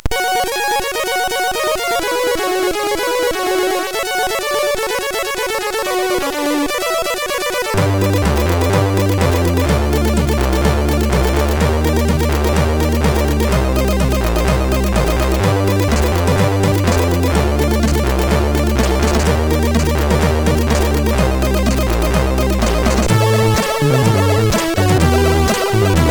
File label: Game Sound, MOS6581R4; Game Sound 3; hvsc